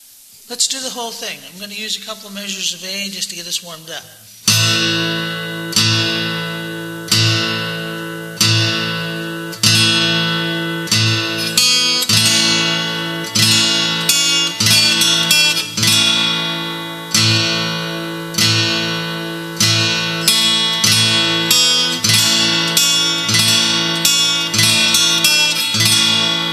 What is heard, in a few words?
DRUMMER